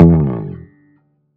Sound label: Bass Slide, 125bpm; Zero-G; Nu Disco; Extra Bass Slides